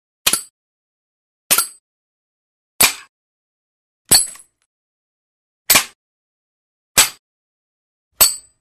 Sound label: Metal Shell Ejects Small Hollow Metal Impacts, Kitch; Hollywood Edge Foley Sound Library